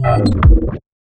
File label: Quick Impact; 特效音